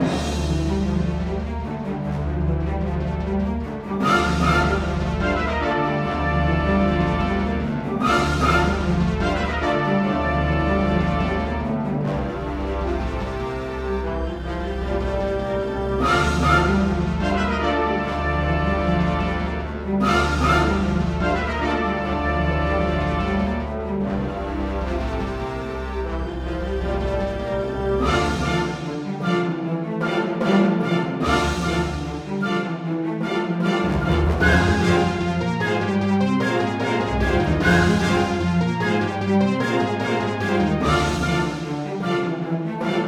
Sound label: emergency no alarm